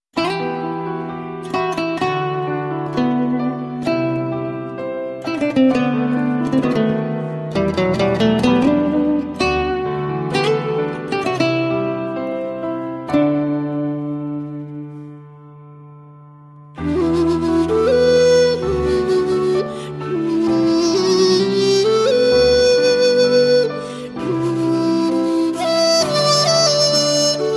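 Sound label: guitar and flute; Гитара И Флейта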